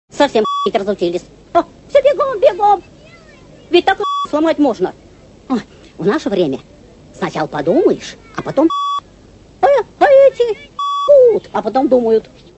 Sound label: beeep